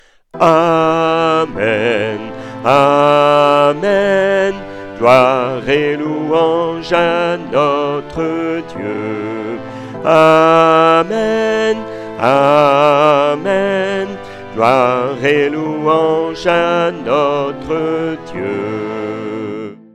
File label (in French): DOXOLOGIE solennelle BASSE